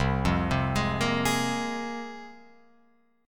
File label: C 7th Suspended 4th Sharp 5th C Guitar Open F; C7sus4#5 arpeggiated